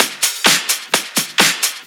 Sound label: Discohouse Loop 128 BPM